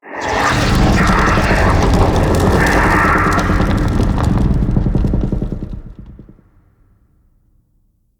Wevetwood Tree Creak Speak

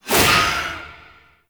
Void Heavy Scythe Alt Whoosh; Warframe; Duviri; Incarnon Adapters; Melee

